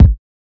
strong bottom end bass drum single hit b key

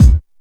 solid steel kick drum sound a sharp key

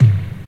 deep tom drum sound g sharp

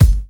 raw steel kick drum g key

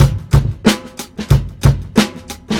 92 bpm drum beat c sharp key

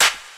2000s short acoustic snare sample f key; snare drum samples

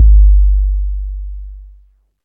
big room bass drum single shot g key; kick drum samples

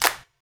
clap one shot b key; percussion single hits one shots

